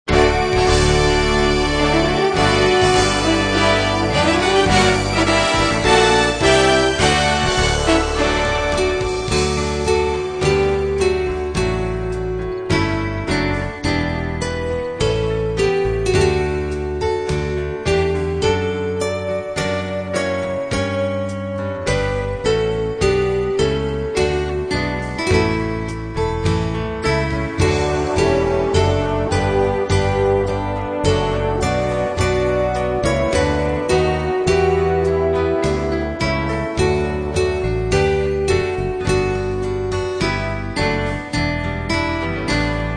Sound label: Instrumental Hymns